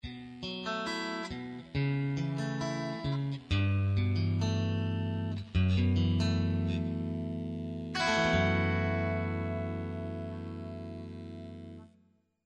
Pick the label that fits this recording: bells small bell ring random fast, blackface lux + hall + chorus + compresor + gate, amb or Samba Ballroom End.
blackface lux + hall + chorus + compresor + gate